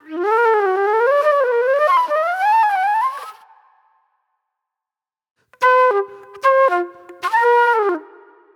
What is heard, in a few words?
Raw Funk Flute